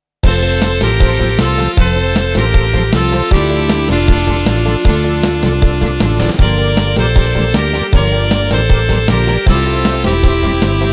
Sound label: Folk Twist